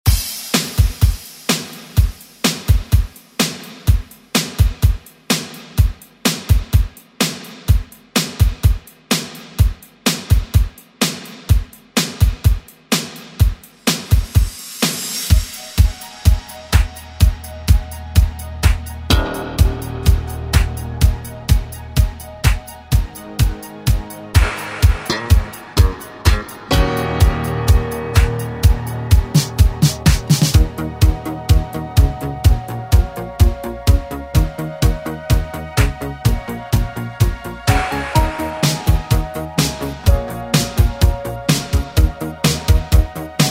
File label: 80s Re Drum; DJ Mhark